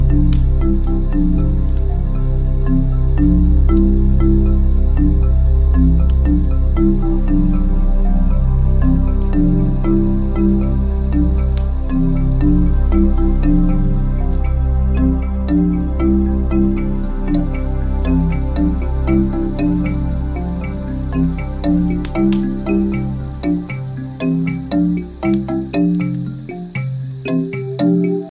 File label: relaxing ringtone; i phone x